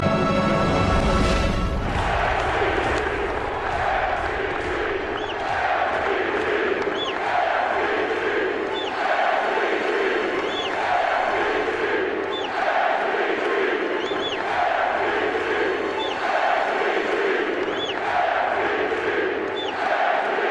Chant; Kobe; Mvp

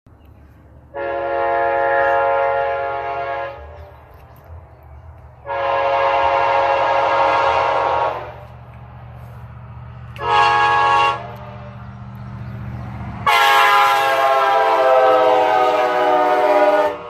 horn; Nathan K5LA

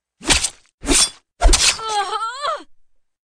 Knife Death

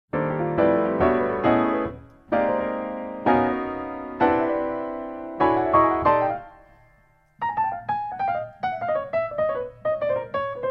Jazz Jam

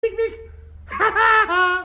Pik pik hahaha

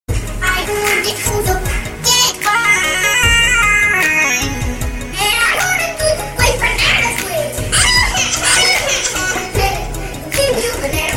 Auto Tune

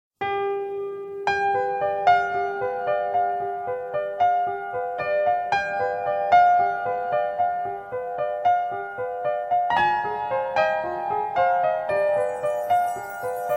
Slow Music

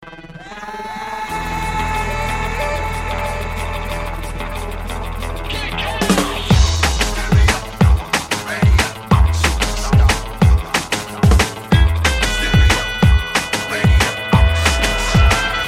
Cool Sms

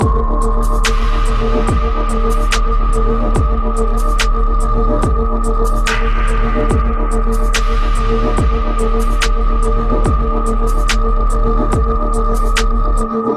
Dark Minimal Dub

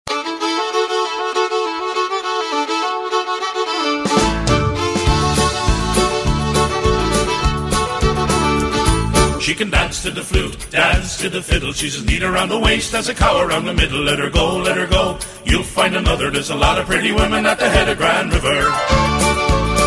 Fiddle

